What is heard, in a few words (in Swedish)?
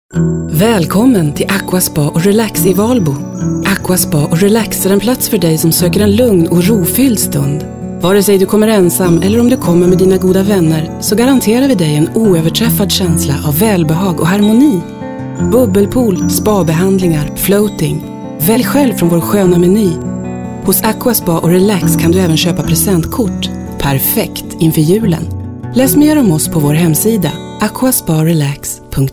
Aqua Spa och Relax